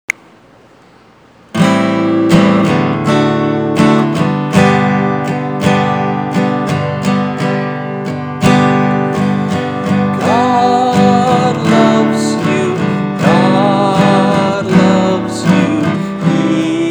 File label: guitar